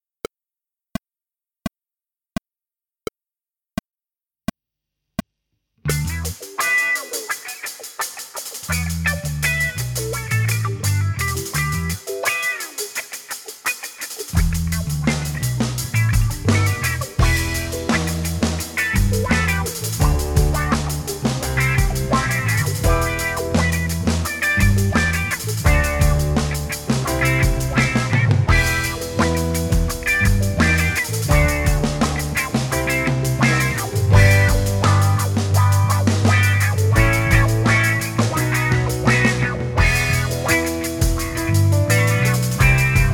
With drums

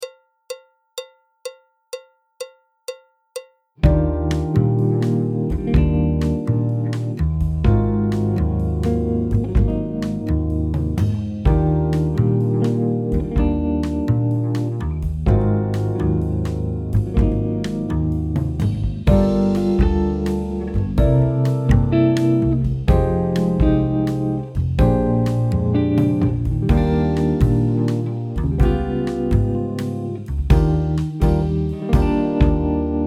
clarinet, flute; Jazz Syllabus Level 4

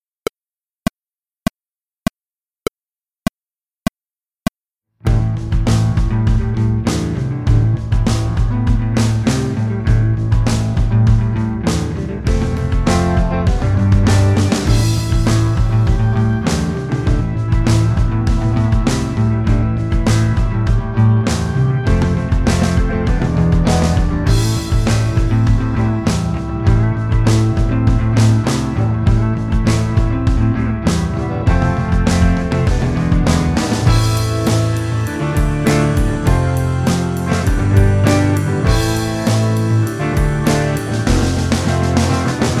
With drums

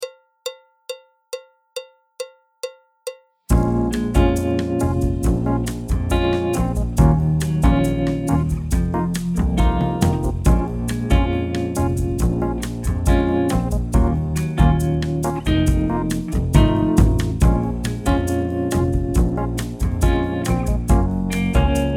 clarinet, flute, tenor, trumpet; Jazz Syllabus Level 4

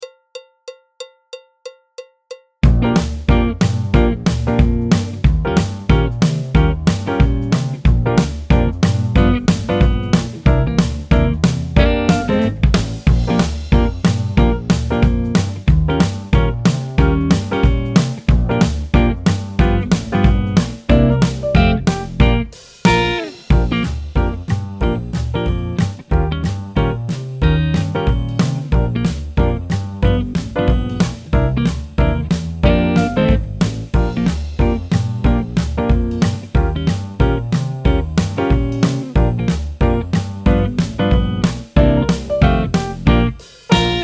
clarinet, flute, tenor, trumpet; Jazz Syllabus Level 4

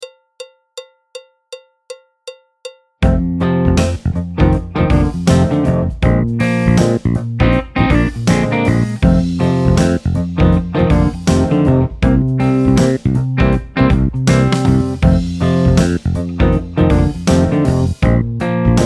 Blue Trainers, alto, trombone, piano; Jazz Syllabus Level 4

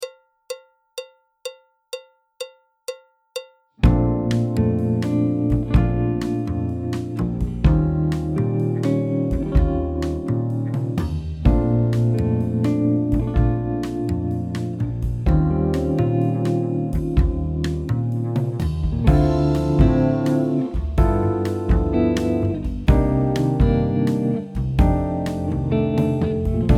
alto, trombone, piano; Jazz Syllabus Level 4

